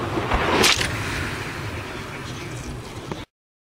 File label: takeoff; EAU CLAIRE HTS; THOMAS NAUNAS